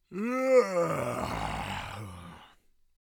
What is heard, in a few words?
Orc creature yawning